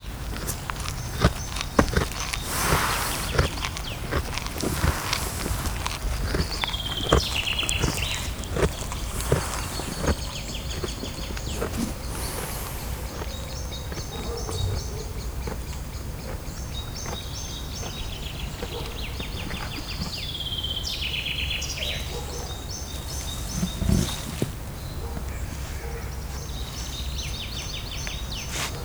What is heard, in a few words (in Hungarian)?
takarmányt eszik, kétpúpú teve; szegedi zoo 2011